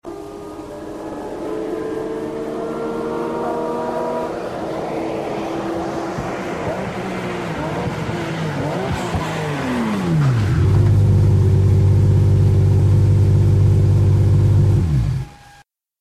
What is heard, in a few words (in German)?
Porsche kommt